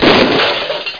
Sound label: CRUNCH